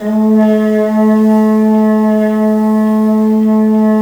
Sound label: BRS TPMT, Harmon Amb; Roland L-CDX-03 Disk 2